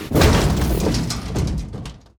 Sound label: Safe Hit